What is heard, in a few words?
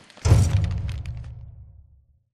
barker lowered; Titanfall 2